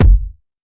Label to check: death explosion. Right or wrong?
Wrong